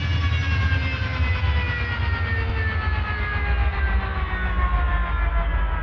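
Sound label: engine decel; tie interceptor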